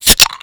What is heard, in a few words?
FLIP TAB OPE, PERCMETALIC; Rarefaction; Poke in The Ear With A Sharp Stick